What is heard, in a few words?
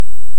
Hydrophone; OneOcean